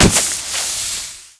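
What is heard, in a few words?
destroy bag flour; Explosions; Call of Duty Modern Warfare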